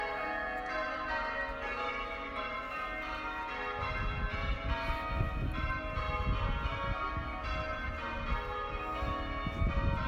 sheffield cathedral bells ringing in may in the sunshine